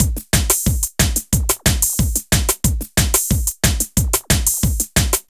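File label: drum loops, left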